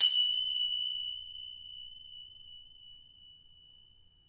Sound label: bell plate